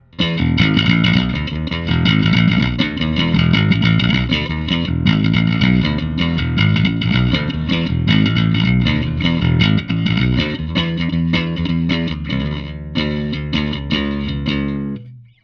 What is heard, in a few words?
humbug, bass